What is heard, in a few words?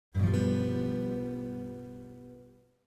g dominant 7 open